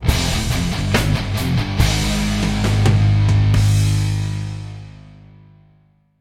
round win zombie; music; ZB zombie

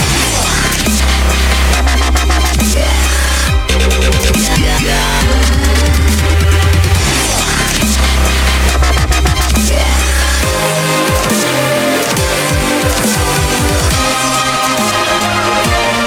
dubstep gun long

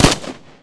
mac10, weapons; czero opforce

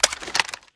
reload empty mag out, AR-15; Assault Fire

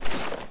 deploy, weapons; czero opforce

